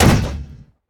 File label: death bodyfall; Smite; god Kuzenbo